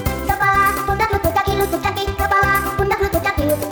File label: Kids Song